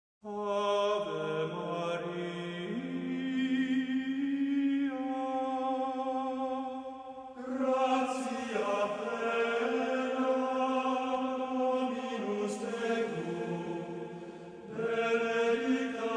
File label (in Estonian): Gregoriuse laul Ave Maria